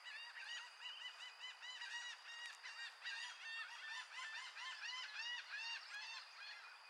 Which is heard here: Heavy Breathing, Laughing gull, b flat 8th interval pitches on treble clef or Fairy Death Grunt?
Laughing gull